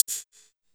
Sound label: HH CLOSED, Disco House